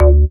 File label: Bass Shot D